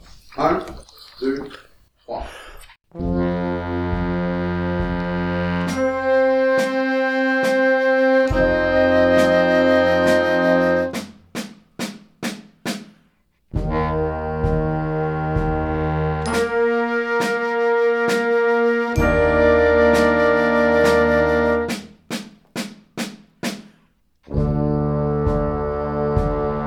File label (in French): pont en construction les clarinettes claironnent noire a 70